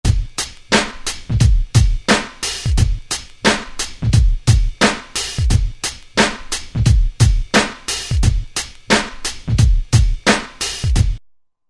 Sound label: 88 HH LoFi; Roland SR-JV80-12 Hip Hop Collection